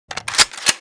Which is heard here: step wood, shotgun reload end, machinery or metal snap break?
shotgun reload end